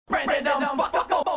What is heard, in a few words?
alert; 4chan